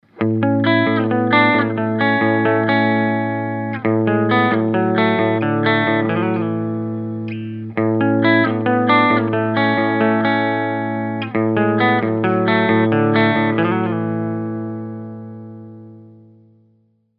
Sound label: clean, humbuckers; bounty hunter c; pickups